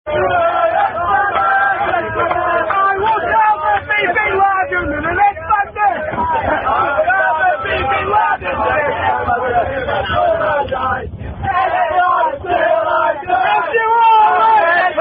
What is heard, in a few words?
Various Football Chants; On The Way To Amsterdam